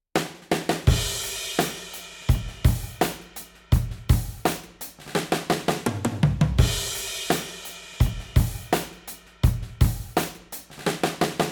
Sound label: Stereo Room UA 610